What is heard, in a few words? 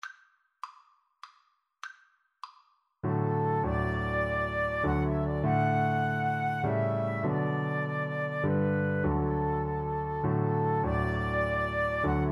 amazing grace, Flute Cello Duet